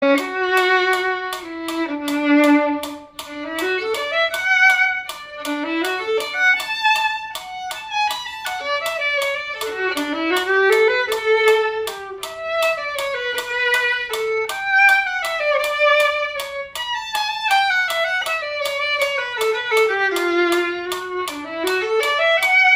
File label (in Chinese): 小提琴; 賽滋 第四號學生協奏曲 第三樂章; 新版 篠崎小提琴教本 第三冊; 示範演奏